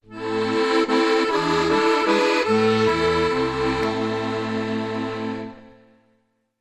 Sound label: Accordion Bridge